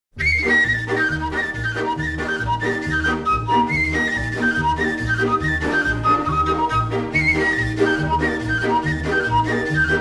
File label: nai, folk orchestra; Rumanian Folk Music